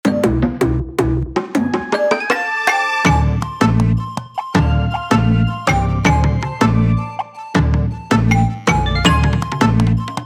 mus jungle; Archvale

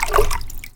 Fluid Impact Medium

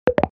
Boopie press

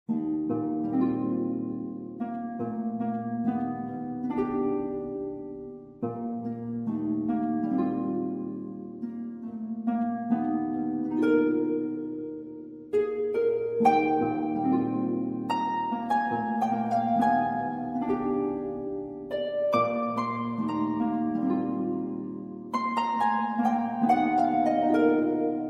Harmonic-Peaceful; Antagonist

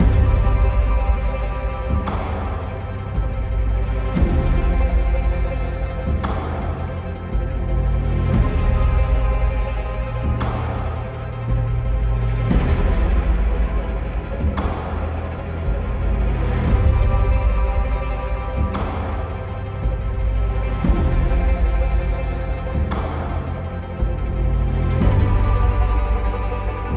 Tension Loop 57.5bpm 8bar

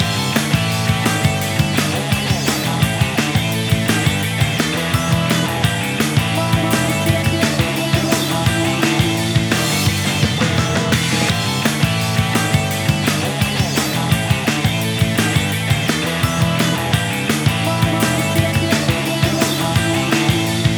Jam Session; Splattack

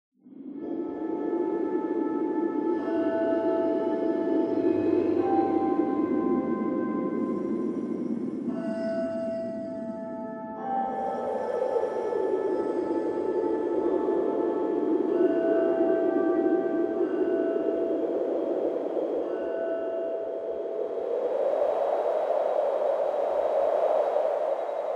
Peaceful Ambiance Theme

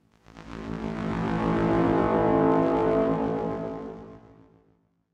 oracle amb; assassin s creed chronicles india

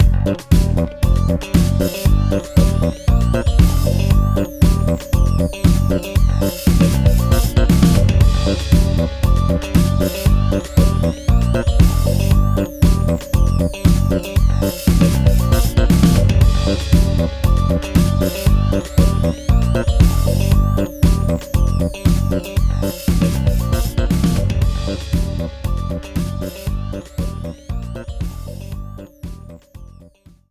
BGM Minigame Menu; Megami Meguri; 3DS

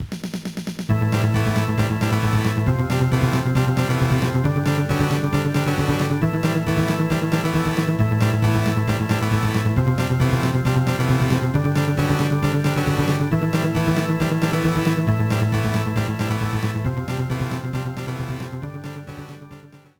Level Up; Shin Megami Tensei II; GBA

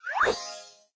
Video for food

